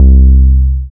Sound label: 808 c high, trap